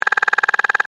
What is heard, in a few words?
Read Out Vintage, Scifi, computer; Science, Fiction; Computers; BlastWave